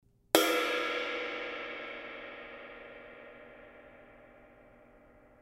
K Constantinople Renaissance Ride, cymbal

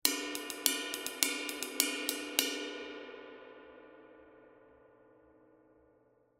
K Custom Special Dry Ride Ride Pattern, cymbal

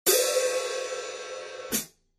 15in New Beat HiHats, cymbal sound

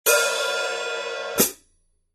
14in Quick Beat HiHats, cymbal sound

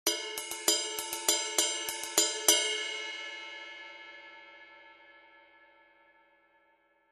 20in Heavy Ride, cymbal

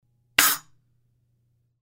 S China Splash Choke Crash, cymbal sound

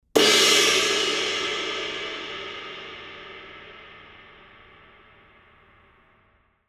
K Con Med Thin Ride Low Crash, cymbal

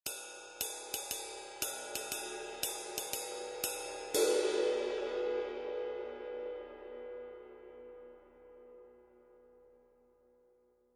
20in Custom Dry Ride, cymbal sound

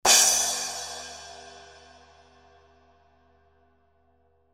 Hybrid Splash, cymbal

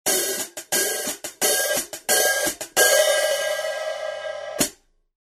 12in Special Recording HiHats, cymbal sound

